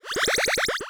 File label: Cry